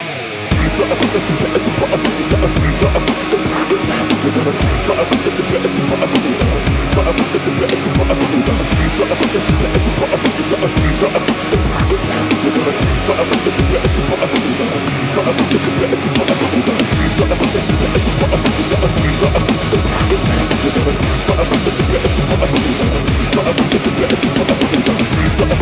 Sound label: Beatbox (Metal Remix); Morshu